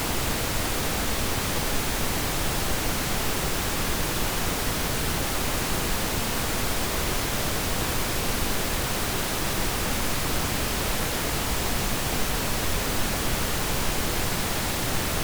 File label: pn full fr -20dBFS -23dBrms L+R